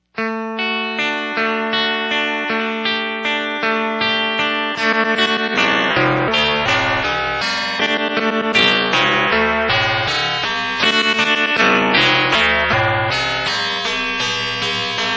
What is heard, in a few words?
ring